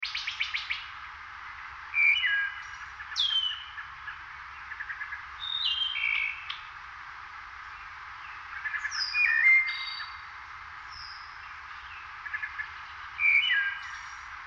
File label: Wood Thrush